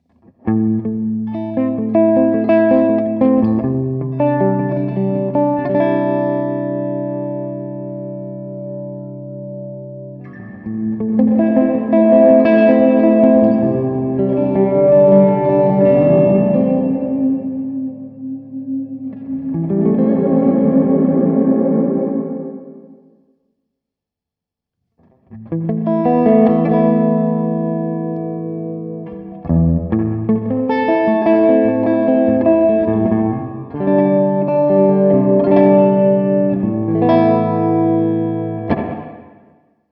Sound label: Room